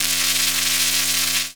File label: Buzzing Power Glitch